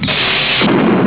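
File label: door close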